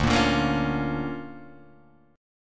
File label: D Minor Major 7th Sharp 5th, D, Guitar, Drop D